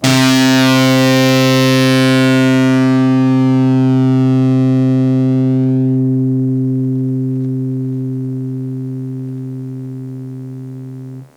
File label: Blues Pick C3, Blues Guitar